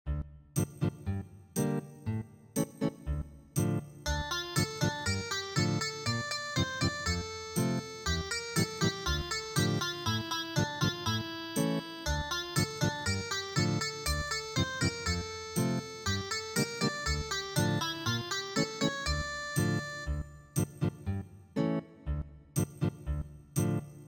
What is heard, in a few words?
Ethnic Guitar